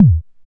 BD ANALOG; Sound & Vision - Gigapack II CD 2

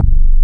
KNOCK BASS

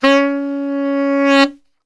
C#2 SAX, Brass Super Section; Best Service

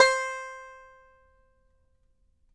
banjo C5, banjo notes; Sampleheads - Whole Lotta Country 1 & 2

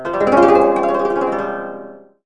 koto gliss; AKAI S-Series CD-ROM Sound Library VOL-7

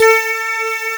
SYN, SYNTHESIZER; DIGI WAVE #1

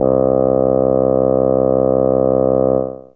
Bassoon C4, Fairlight CMI IIe Reeds

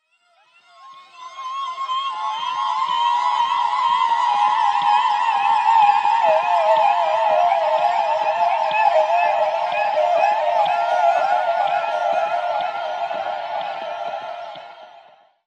VOCAL WHAT; Polyend

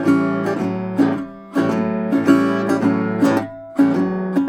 Blues Guitar Samples, riff; ILIO - Fretworks

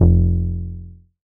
bass F1, ARP Odyssey; Polyend; Simulacrum Sounds; 1 Shots; Synths

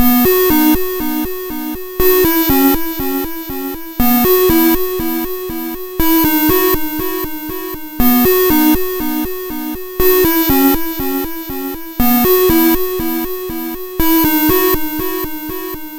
Apple II Synth, Chiptune

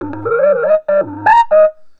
Throatline, Industrial; Nasty

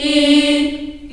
D3 Choir Acoustic; CHILD; USB Soundscan vol.28; AKAI; CHILD IHS

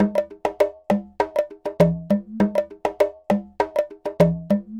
Conga Groove, Latin Percussion; Sonic Foundry (Sony Creative Software); Joe Vitale Latin Percussion; Grooves

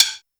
snare, Roland R-70

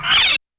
Tape Rewind, YAMAHA RM1X

